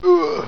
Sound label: drop flag